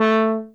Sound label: Horns